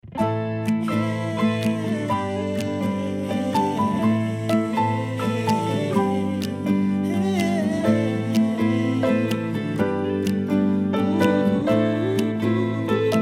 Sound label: 30sec jingle; Verelst